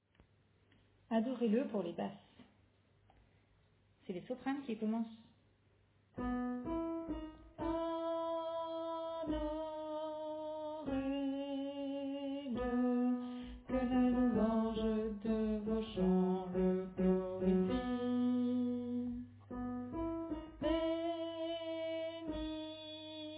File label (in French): Basse